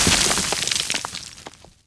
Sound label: debris; firearms